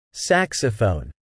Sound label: saxophone